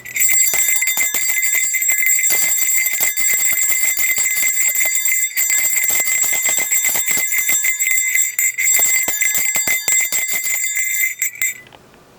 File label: bearbell